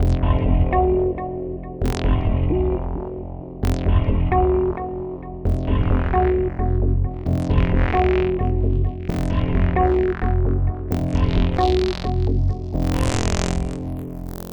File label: Warned 132 Fmin, Hardcore Breakbeat; Big Fish Audio; Hardcore Breakbeat Action